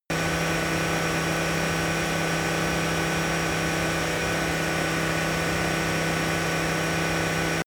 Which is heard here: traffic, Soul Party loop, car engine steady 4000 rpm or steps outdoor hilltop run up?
car engine steady 4000 rpm